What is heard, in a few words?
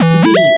retro synth beeps